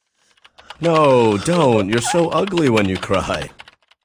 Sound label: so ugly cry